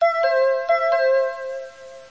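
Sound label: pleasant-sounding ring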